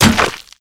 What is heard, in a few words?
pumpkin action breakthrough, weapons